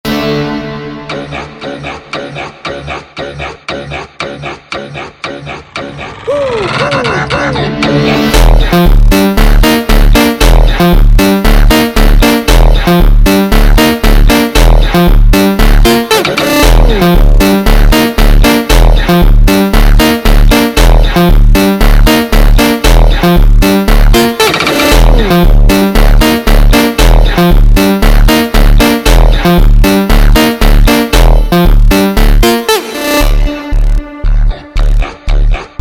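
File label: slowed Reverb, phonk music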